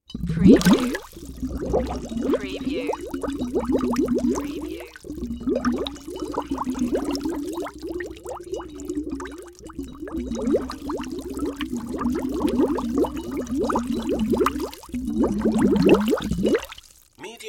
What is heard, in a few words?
Tank Bubbles Long